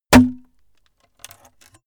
sword hit wood pullout

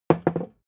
hammer wood bounce

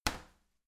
garbage container light lid shut

